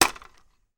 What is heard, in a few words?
toy helicopter blades push spin; sound effects one